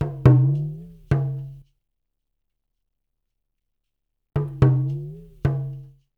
TALK DRUM, ETHNIC INST; Spectrasonics; Liquid Grooves 1 & 2

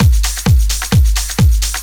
groove, DRUMLOOPS

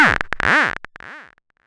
Sfx, Trance; Zero-G Trance Inducer; General Sfx

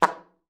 SLPA E3, ALTOSAX; Xsample VOL-2

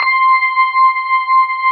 B3 HAM, Keyboards Old School

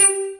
BUG BASS; Pro-Rec - Synthsonic; BASSES

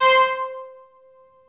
Techno leads, hits and riffs

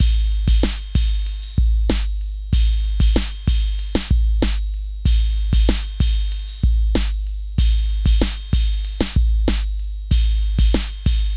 BOXTECH; Funky Xtreams; INIS

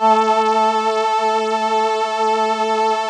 VP-330, String Machines

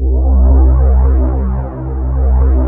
JUNO STR; USB Soundscan vol.49; Analog Fantasies; AKAI

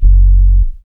BAS, Jungle; E-Lab; XTC Files of Jungle

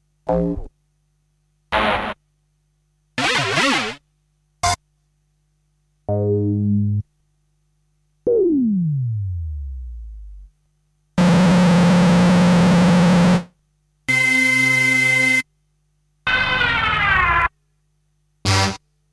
Stabs; Kniteforce; 1000 Samples Volume 1

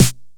Snare, retro house samples, Drum Hits

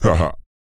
PITCH LOW, Vocal, SCI-FI; 5 SCI-FI CHZ

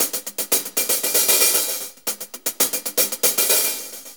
Hi Hats; Sonic Foundry (Sony Creative Software); Loops & Samples Drum Tools; Various Loops; Lemon Hi Hats

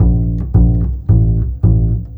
Bass Pizzicato, Orchestral, Rock Pop; Sonic Foundry (Sony Creative Software); Orchestral Series VOL-4; Rock and Pop; Rock-Pop 13

